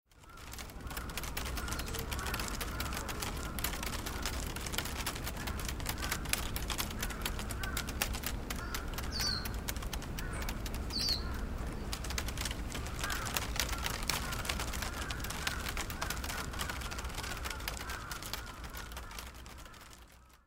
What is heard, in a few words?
syuro swing